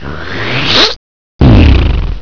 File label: Slurp Pfff!